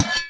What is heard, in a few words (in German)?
found sound schaufel du spaten; UNUSUAL KITS and FX; Werkstatt Found Sound Kit